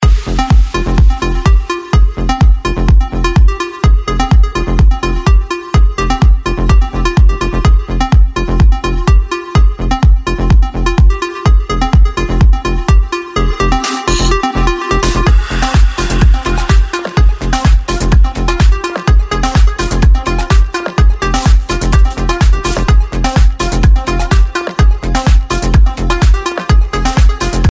rave iphone

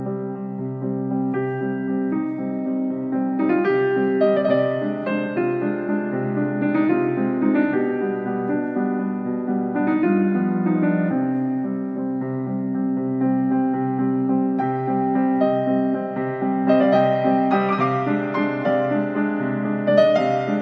piano music

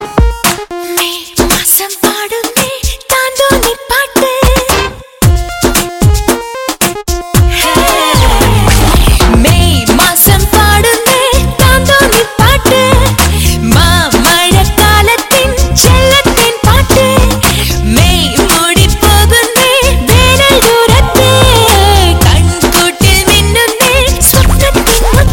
malayalam ring tone

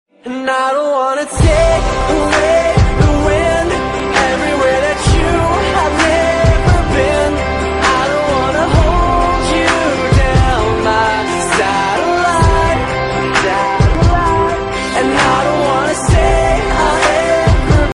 electro

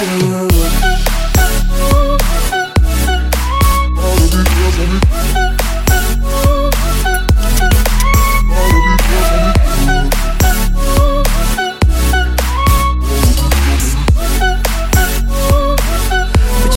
tone

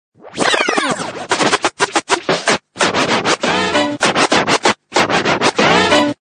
drunked dj sms tone

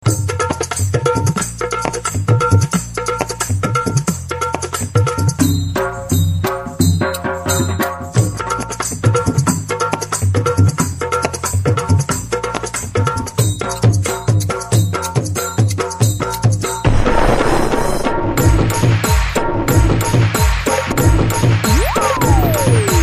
Marathi music tone ringtone

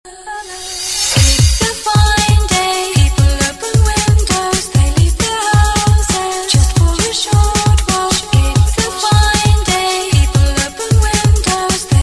hiphop

